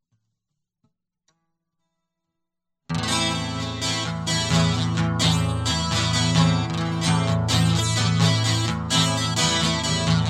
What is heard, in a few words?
Guitar